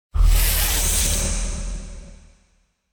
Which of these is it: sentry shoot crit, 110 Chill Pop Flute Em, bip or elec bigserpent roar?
elec bigserpent roar